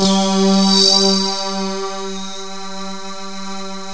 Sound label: WAVE OLA, D50; D50 STORY; East West; Global Sounds